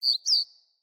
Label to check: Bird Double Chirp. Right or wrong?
Right